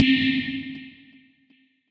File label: Warp Bell, Synth Lead